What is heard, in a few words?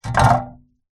industrial shovel metal set down concrete ground